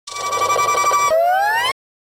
Grito de Kricketune

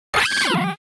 Grito de Weavile

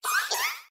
Grito de Sobble

